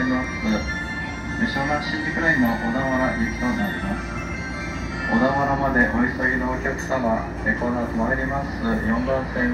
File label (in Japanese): 東海道線 戸塚 南, train sounds